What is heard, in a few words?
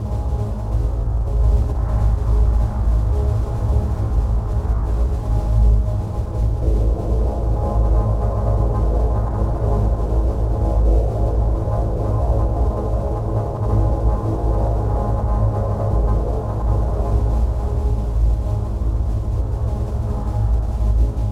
A Bass Grains, Granular Synth Loops; Hydrosphere